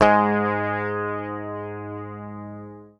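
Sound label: bass, G#2, right channel; PICK; Sampleheads; Will Lee Bass Library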